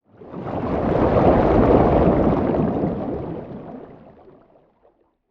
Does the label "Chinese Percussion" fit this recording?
No